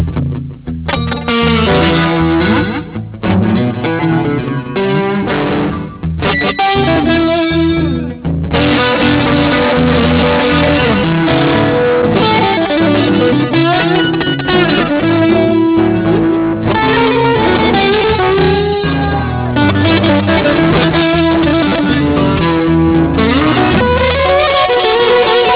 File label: blues